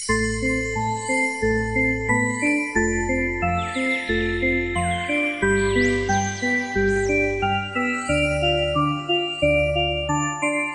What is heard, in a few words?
Bells